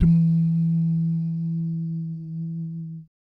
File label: BS DUMM, Vocal Planet Jazz & FX; ILIO; BASS DUMMS